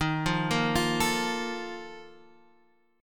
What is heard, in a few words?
A sharp Major 7th Suspended 4th